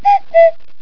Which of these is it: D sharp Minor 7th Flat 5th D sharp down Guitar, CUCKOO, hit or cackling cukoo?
CUCKOO